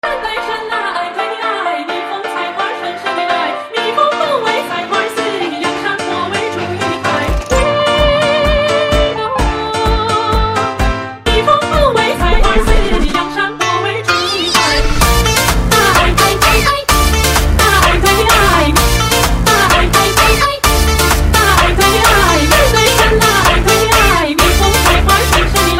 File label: Echoing Chinese Phonk; ringtone